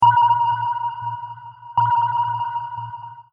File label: sonar